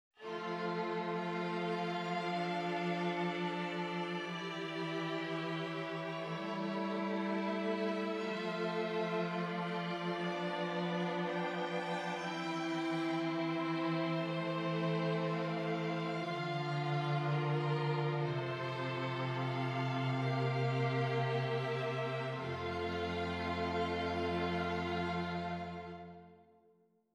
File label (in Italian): esercizi di contrappunto semplice A quattro voci